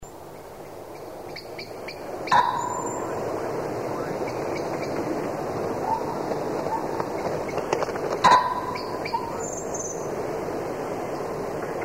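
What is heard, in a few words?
pheasant; bazant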